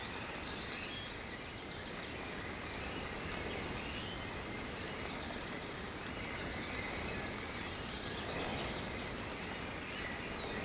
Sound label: ambience forest day calm drizzle; Rust